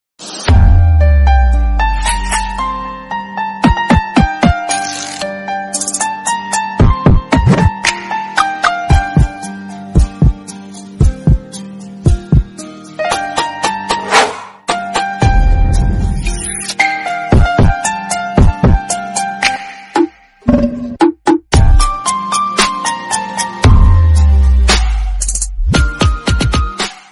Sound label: instrumental love musical song tone ringtone